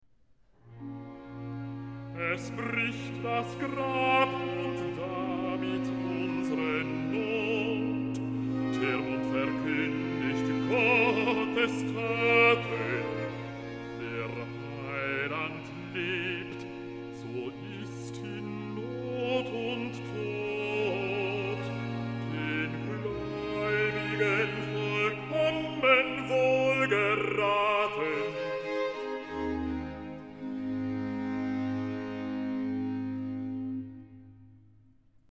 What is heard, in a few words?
Recitativo (B); Bach - Easter Oratorio; Bach, J S; Erfreut euch, ihr Herzen, BWV 66; Es bricht das Grab